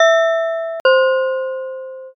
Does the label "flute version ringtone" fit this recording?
No